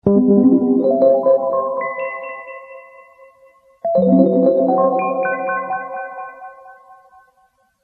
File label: RHODTRIP, KEYS